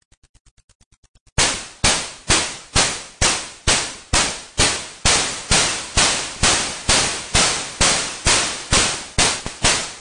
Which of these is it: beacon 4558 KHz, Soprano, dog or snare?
snare